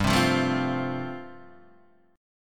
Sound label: G 7th Suspended 2nd Suspended 4th, G, chord; down